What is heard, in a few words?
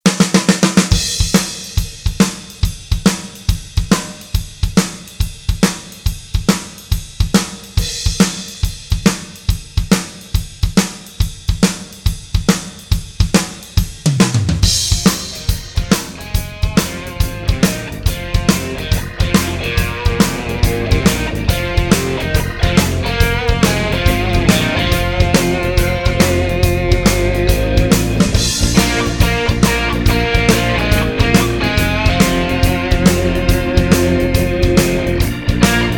blues